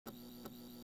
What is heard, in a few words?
bar sign buzz